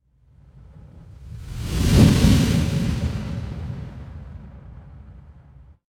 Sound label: fire electric whoosh flame